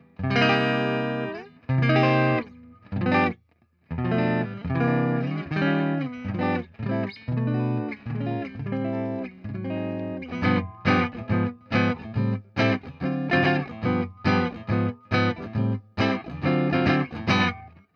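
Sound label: jazzmaster clean; fender classic player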